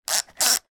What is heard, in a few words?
household timer plug wind